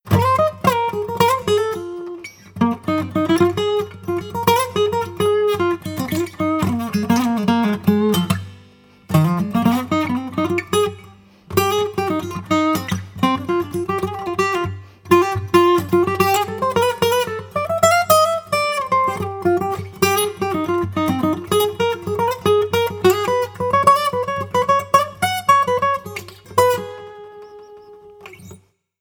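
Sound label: guitar Solo EQ On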